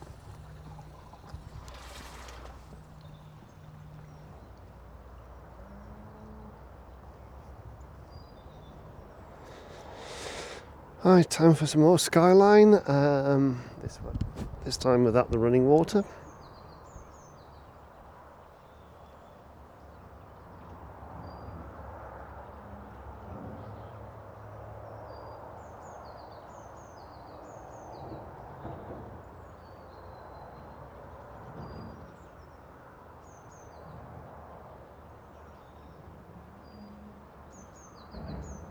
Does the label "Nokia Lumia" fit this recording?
No